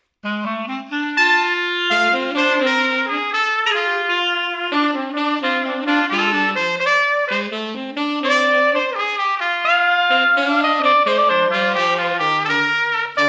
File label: Street Brass Slowhand Blues 85